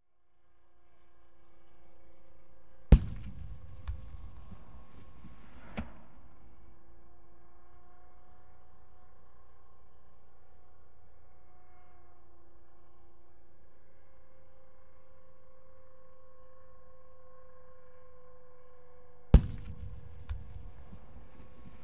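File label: Warfare, Scorpion Firing Hesh, Distant; BBC Sound Effects Library; Explosions Guns Alarms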